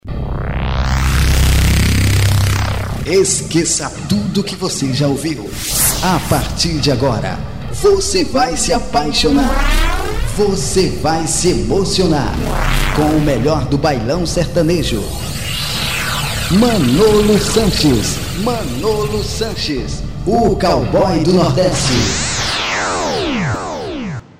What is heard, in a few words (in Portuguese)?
abertura